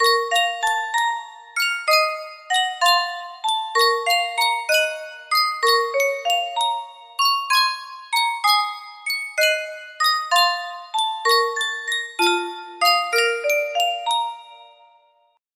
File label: sankyo music box